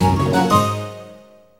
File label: Shooting Range - Next Round; Wii Play